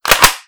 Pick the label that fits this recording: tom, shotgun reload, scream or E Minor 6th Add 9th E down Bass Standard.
shotgun reload